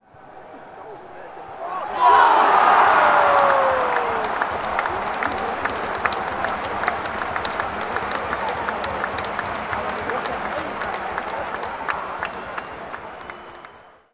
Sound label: Stadium crowd cheering on goal